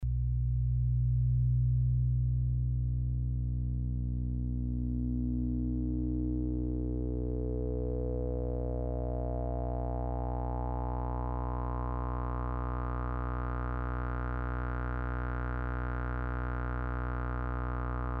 FS LFO Rate Compare, Frequency Shifter; SergeModular; Frequency Shifter Samples

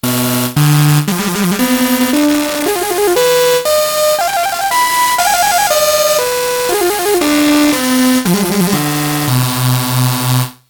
K1II Rhodes C Chord; kawai

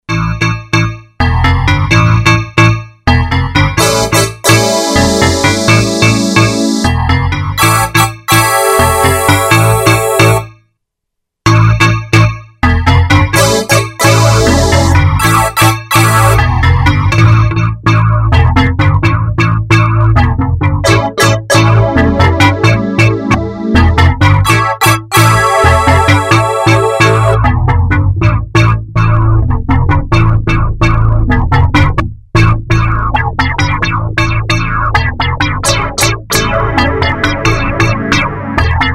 K5 MKS70, K5 MKS70 Hybrid; 3 K5-MKS70-Hybrid

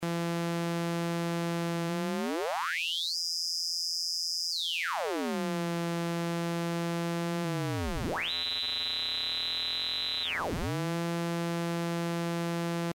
Modcan Exp Shift, Frequency Shifter Samples; SergeModular

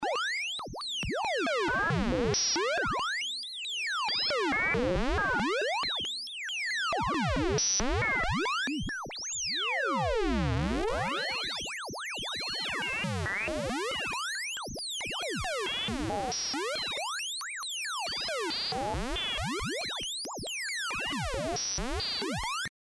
Sequenced Freq Shifts; Frequency Shifter; SergeModular; Frequency Shifter Samples

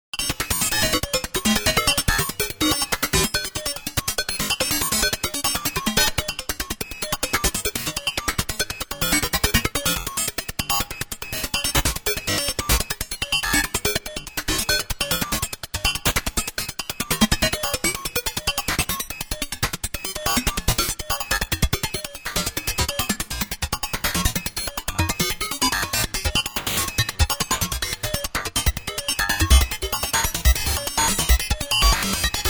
200 w ring

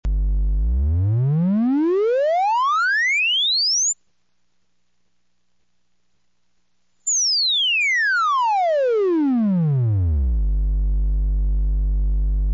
TRI sweep; PSIM-1

